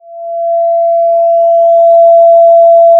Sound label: Sound of a police car siren